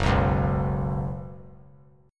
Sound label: F sharp Suspended 4th; strummed; F#sus4